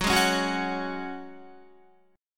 Gb Suspended 2nd, Guitar 4E,4A,5D,5G,5C,6F; strummed

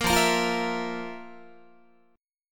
F Major 7th Suspended 4th F, Guitar; strummed

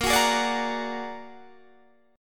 A sharp Augmented 7th, Guitar Standard; A#+7 strummed; 18,21,18,19,19,18; guitar chord

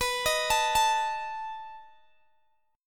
B 7th B down Guitar Open G

